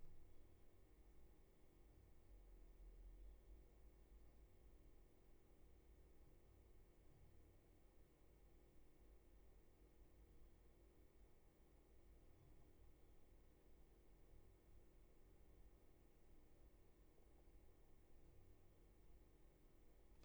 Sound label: roomtone